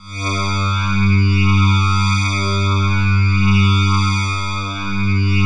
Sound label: Spectrag4, Pads